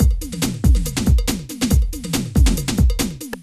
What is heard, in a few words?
techno 140